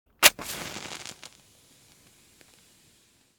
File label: Matches lighting with fire burning striking